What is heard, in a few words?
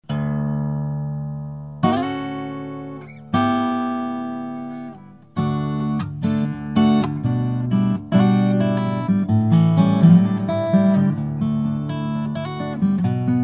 Guitar